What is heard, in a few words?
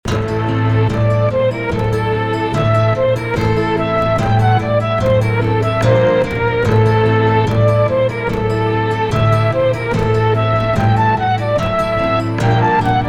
dorigian